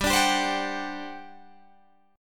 G Major 7th Suspended 4th, G, down, Guitar, Open G